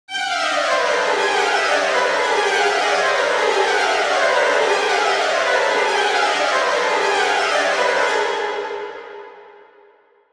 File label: Adrenaline Strings High, horror strings